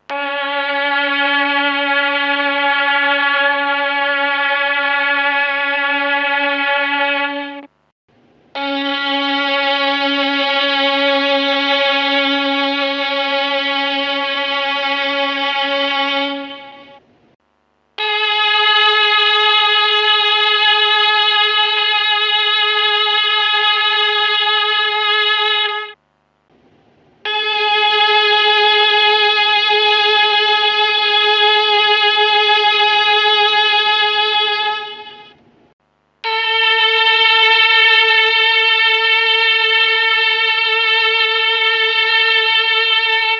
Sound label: tron violins mkII m400 comparison; make the difference between MKII and M400 violins (each note is MKII first then M400 version); newmellotrongroup